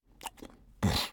羊驼待机声

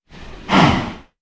牛叫声

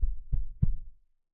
受伤传导声; 嘎枝之心

